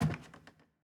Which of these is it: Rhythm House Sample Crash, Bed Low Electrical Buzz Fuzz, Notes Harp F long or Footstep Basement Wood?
Footstep Basement Wood